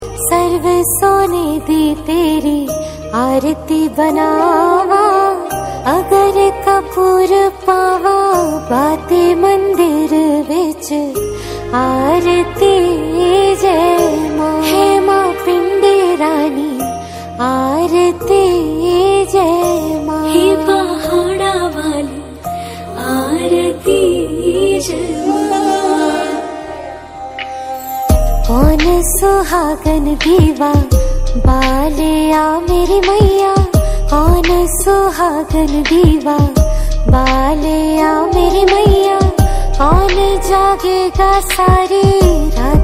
Aarti

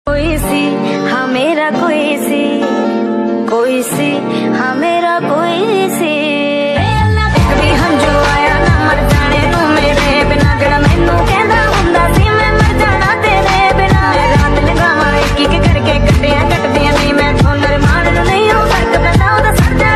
Female Version

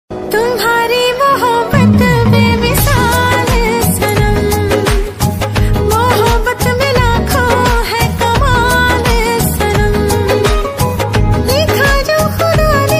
female version

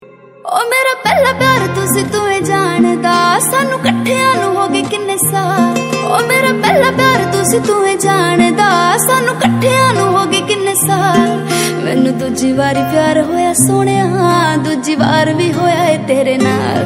Panjabi song

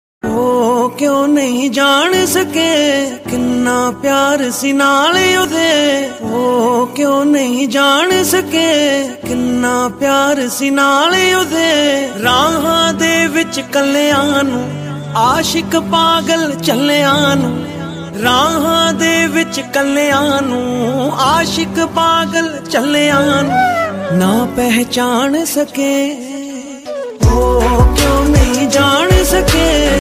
lofi reverb

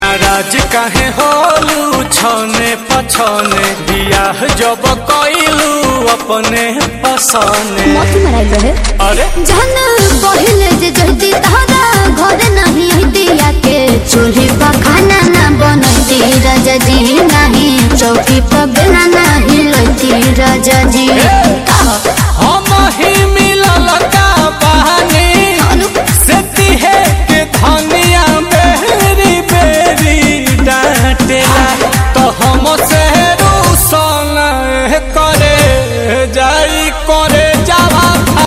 DJ Remix Song

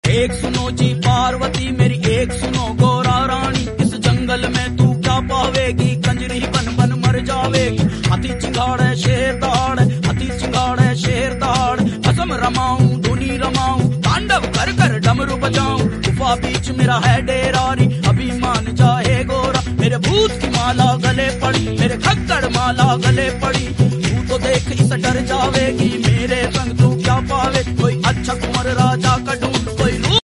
Hindi Song

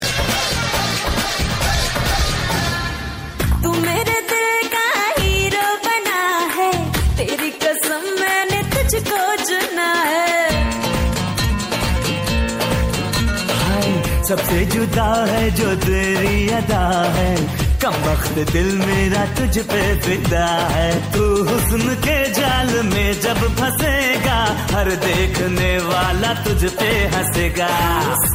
female version

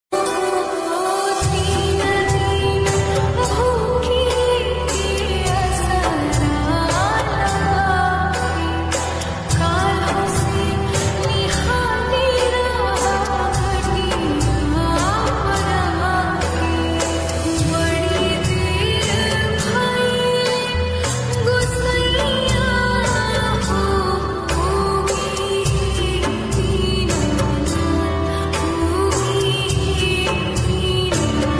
Chhath Puja

